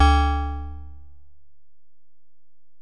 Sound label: Bell, Alesis DM-PRO